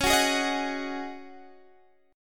Db Suspended 2nd Suspended 4th, Guitar, Standard; strummed; JGuitar

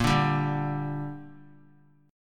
A sharp 6th; strummed; JGuitar

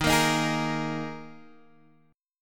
Eb Suspended 4th Eb Guitar Half Step Down; Ebsus4

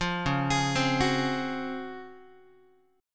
Db Major 11th down Guitar; arpeggiated; JGuitar

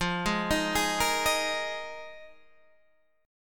Bb 7th Suspended 4th F

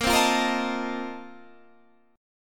Bb Major 7th Suspended 2nd Suspended 4th; strummed

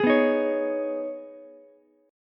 B 6th Add 9th B down Ukulele C6; B6add9 strummed; chord shape; JGuitar

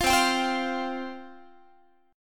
C Suspended 4th C Guitar; Csus4 strummed; 20,20,22,22,21,x

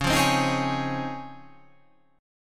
C sharp Major 9th C sharp

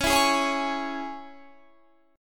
C sharp Minor down Guitar Standard; C#m; strummed; JGuitar

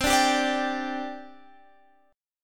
G 5th C

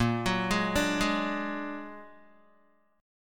Bb Augmented 7th Bb Guitar, chord; arpeggiated; 6,9,6,7,8,x; 4E,4A,5D,5G,5C,6F; JGuitar

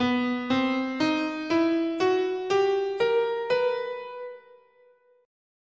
B Double Harmonic Major

